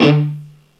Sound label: MUTE D, ROCK GUITAR; Greytsounds VOL-1; ROCK GUITAR2